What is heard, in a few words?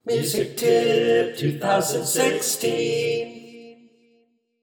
Jingle; MusicTipp